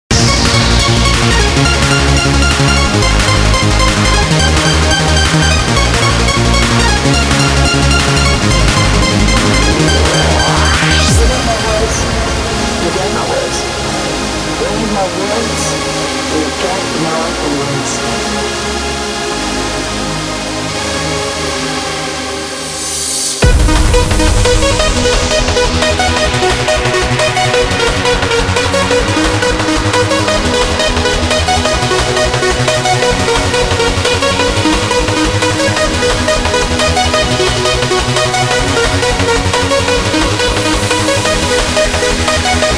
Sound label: UK Hardcore Leads; Darwins